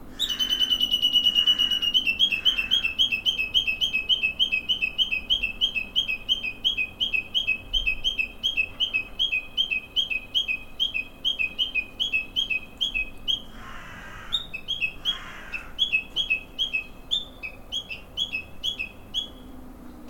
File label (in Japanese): uguisu taniwatari